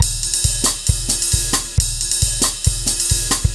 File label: TV Suspense Drumz, 135; Zero-G Kocktail Kollection; Construction Kitz (Lounge); TV Suspense Mixes 135